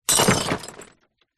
impacts glass bottle shard hit junk in plastic bin smash